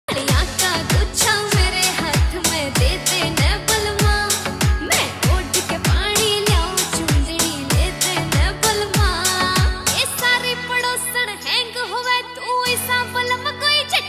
haryanvi ringtone

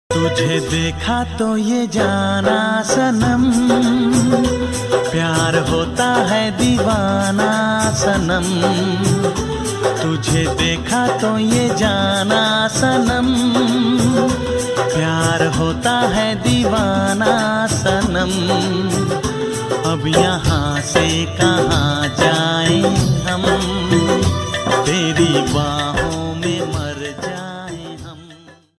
Romantic Ringtone Hindi Ringtone

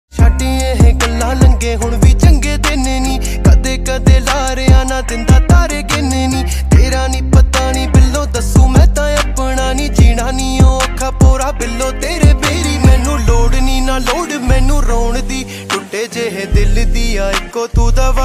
ringtone

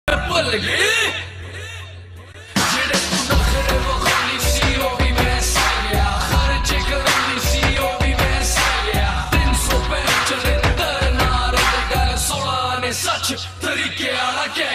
lofi remix, hindi ringtone

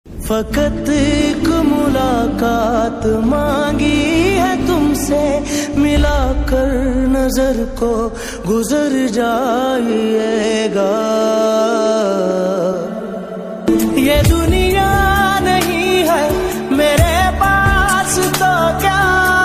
pakistani ringtone

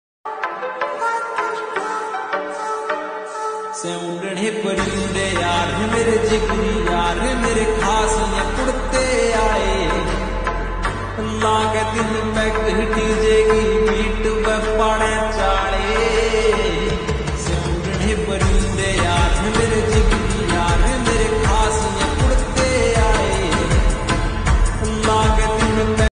(slowed) haryanvi ringtone

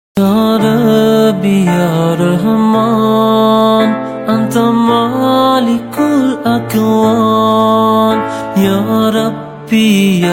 arabic ringtone

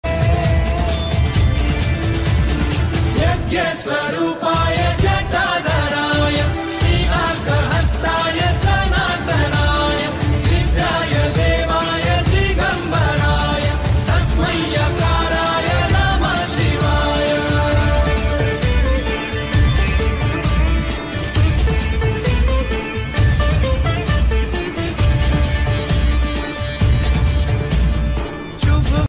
bhakti song, hindi bhakti ringtone

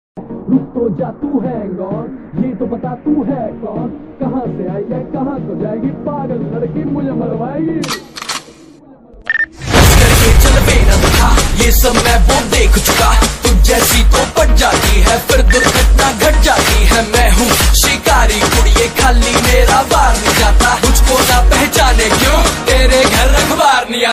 slow reverb hindi ringtone

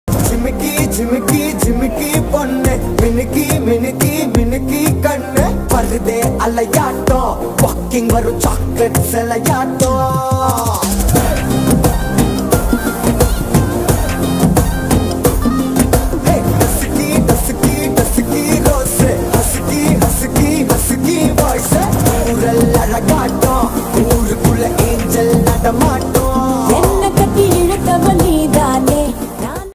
tamil ringtone

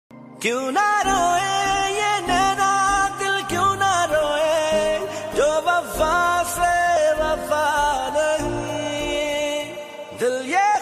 Pakistani Ost Ringtone

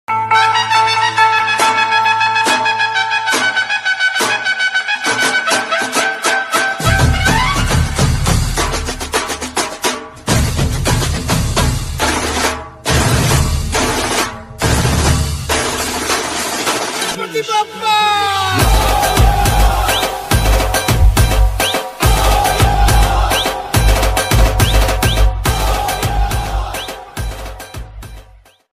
Devotional Instrumental ringtone

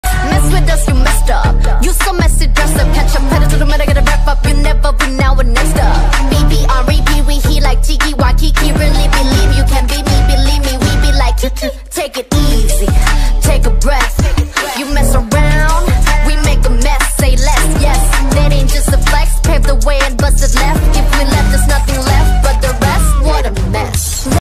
korean ringtone

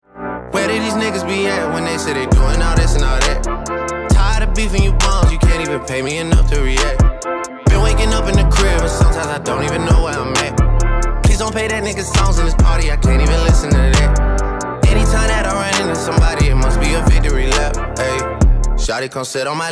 ringtone

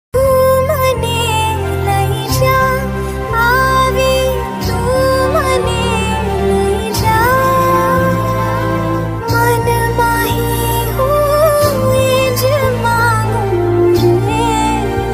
gujarati ringtone

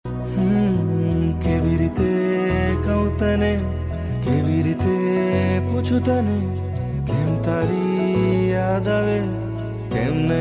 gujarati ringtone

